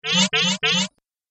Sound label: Triple Bleep Laser Button; Andrew Fleming